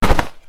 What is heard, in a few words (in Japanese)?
nuno; easyrpg; Ib